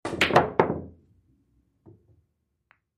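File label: Pool Game Billiard Balls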